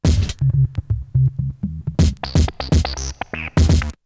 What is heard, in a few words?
Drumloop; ZERO G - Datafile DISC 3